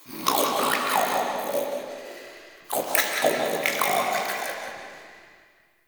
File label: Root Cave Creature Snort; Ambience; One Offs; Neathuns Root Cave